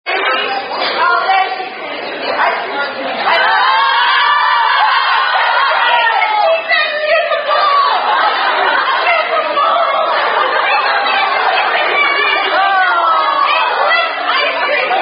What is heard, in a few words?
social after rugby; Shooting The Bowl (Aka Shooting The Boot) After Messing Up A Verse